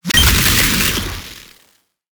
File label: weapon staff volatile surge